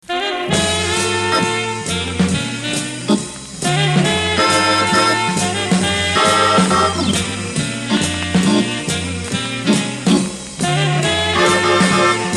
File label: ocsblues